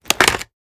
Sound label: sg550 mag outta here; tacz; daffas arsenal